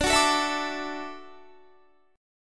D-Major Add 9th D down Guitar; strummed; JGuitar

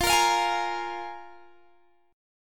F Minor Double Flat 5th, Guitar, Open G

